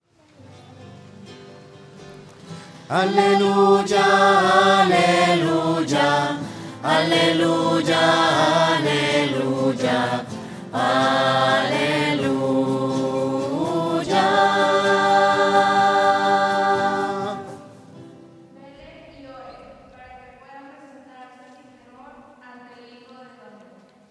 aleluya su victoria voces; misas 2012

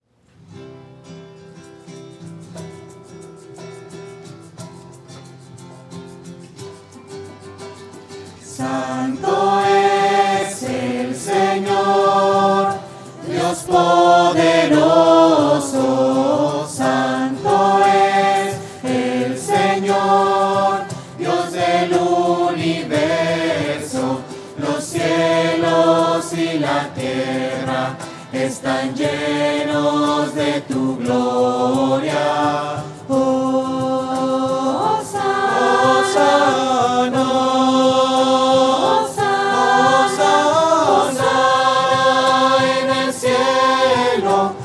santo shuwa voces; misas 2012

